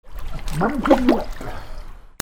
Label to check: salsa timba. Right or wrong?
Wrong